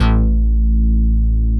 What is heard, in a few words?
PPG BASS; BASS SOUNDS